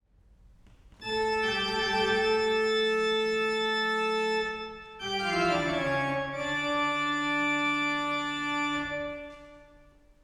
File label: bach praeludium d moll, Orgel